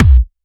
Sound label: Bassdrum; Vengeance Samples; VEE Bassdrums